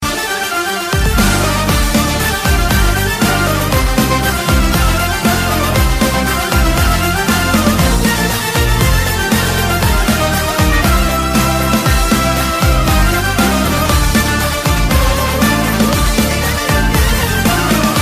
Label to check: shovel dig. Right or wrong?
Wrong